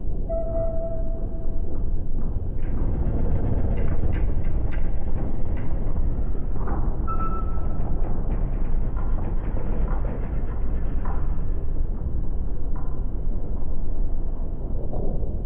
Door Move

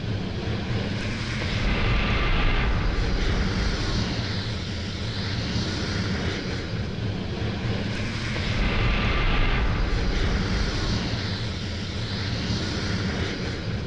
Flame Machine

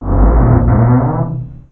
Collision Ext

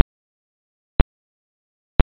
SPKPHASE

